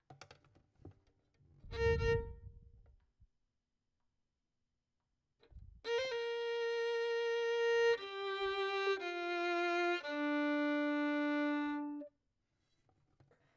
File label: Violin; Verdigris Music